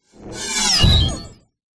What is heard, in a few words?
science fiction robot burst